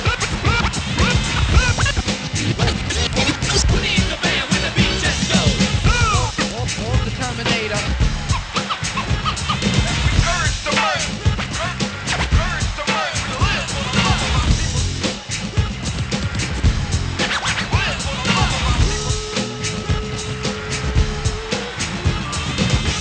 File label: hiphop